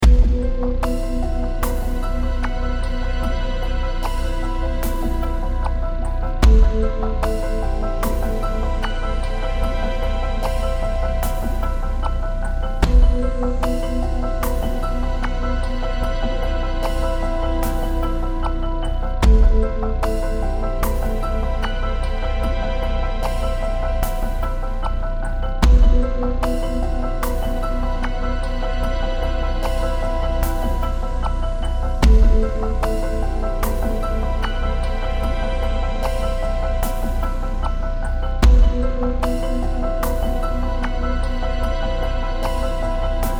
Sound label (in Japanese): 静かな森 deep ver